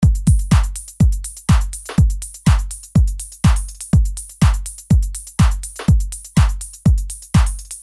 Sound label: 123 Drums Full